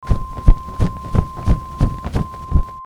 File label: flap